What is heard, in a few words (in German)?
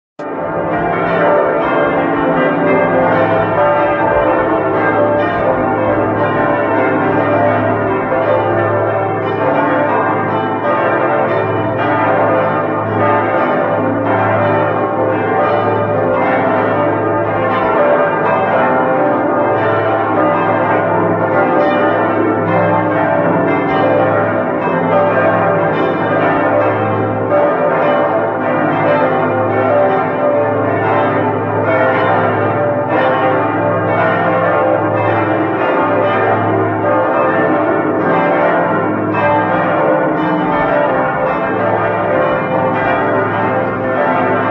limburger dom glocken